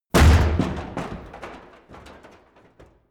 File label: crash large metal box; objekte